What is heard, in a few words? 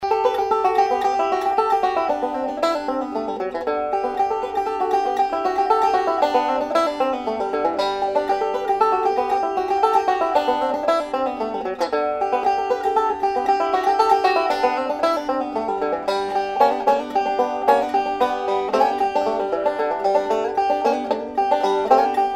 gibson mastertone standard